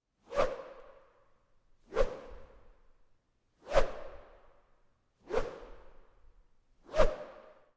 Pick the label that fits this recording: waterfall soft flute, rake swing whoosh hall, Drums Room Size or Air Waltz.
rake swing whoosh hall